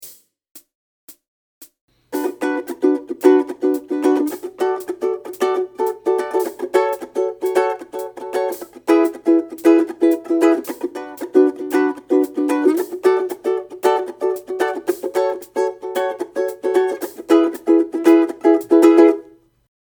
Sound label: Strum Fast, ukulele